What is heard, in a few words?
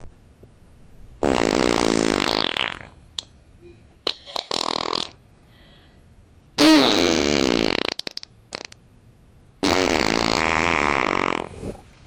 a huge fart followed; prank